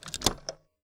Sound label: Typewriter